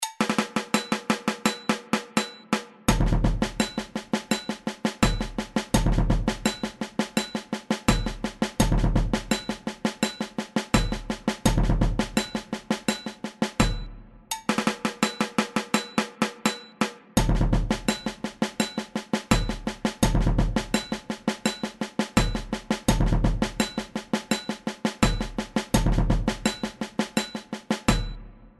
Surdo Showcase clik; gutchaka

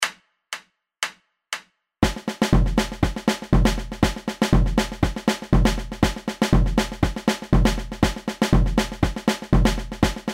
Caixa Pattern 120bpm, samba, Batucada

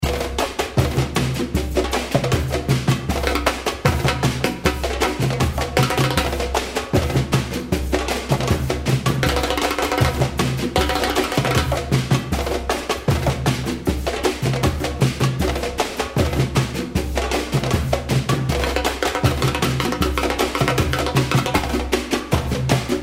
Samba Reggae Short Loop

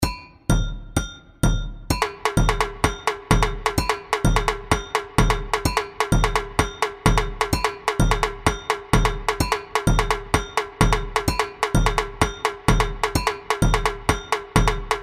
Teleco, Tamborim, samba, Batucada; TelecoTeco

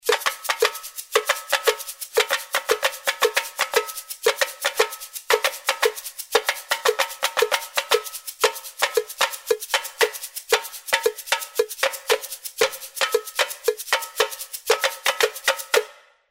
Tambo Desenho, Tamborim, samba, Batucada

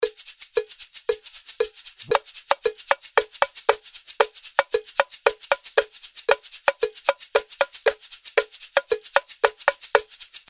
Tamborim Ride, samba, Batucada

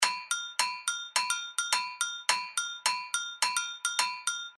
Maracatu Agogo; Carnaval; Carnaval2105

